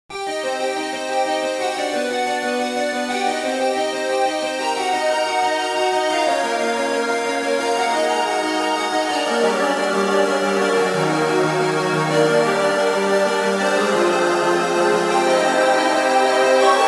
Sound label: Creepy